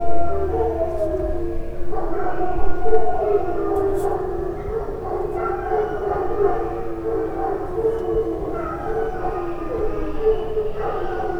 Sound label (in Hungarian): vonyitas fele ejszaka